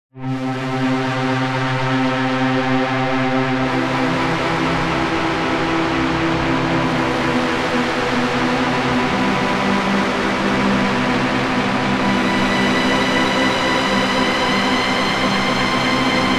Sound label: Breathing sounds; Artsqool